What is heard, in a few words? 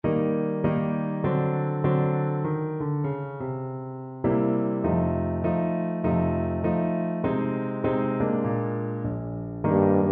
nobody, tuba